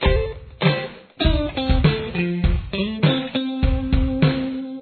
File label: lick, guitar